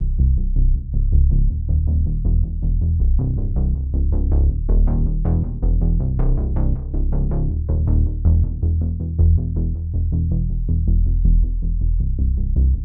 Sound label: INFILTRATION BASS (Cutoff Resonanz Detune)